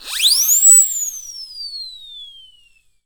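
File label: WHISTLE SIREN, Percussion; ILIO; Synclavier Percussion 2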